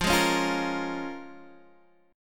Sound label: Bb Minor 7th, guitar